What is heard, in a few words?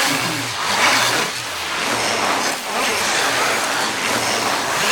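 foley fastrope loop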